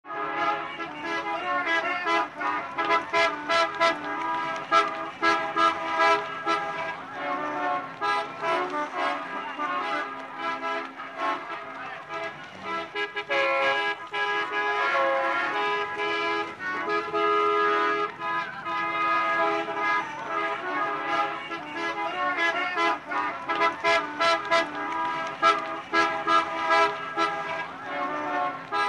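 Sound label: car traffic jam car horns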